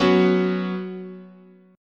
F sharp Suspended 4th, chord, guitar

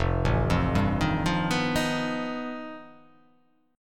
F 9th Flat 5th F down

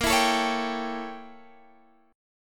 A 7th A sharp, chord; A7/A#; strummed

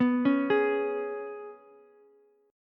G sharp Minor Double Flat 5th, Ukulele; arpeggiated; x,11,9,11

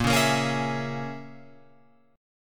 A sharp Minor 7th Flat 5th, Guitar, Open F; A#m7b5 strummed; 10,8,10,8,11,11

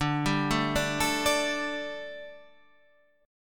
D Suspended 4th D, down, Guitar Standard; arpeggiated

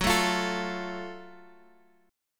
Gb Minor Major 7th Gb down Guitar; strummed

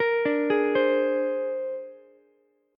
C 7th Suspended 2nd Sharp 5th, C, down, Ukulele; arpeggiated; chord; C7sus2#5

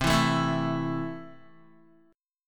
C 6th C down Guitar; C6 strummed

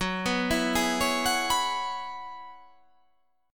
F sharp 9th Suspended 4th F sharp, chord

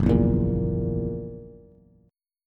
F sharp 7th, Bass; strummed; JGuitar

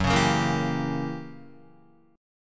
Gb 7th Flat 9th Gb Guitar; Gb7b9 strummed

